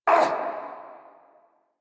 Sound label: Pain Lich A